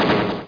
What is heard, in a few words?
aa fire; winwar2